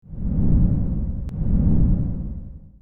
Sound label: Dragon Horse Run